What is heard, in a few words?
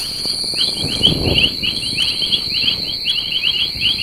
chorus; In Situ; ISWI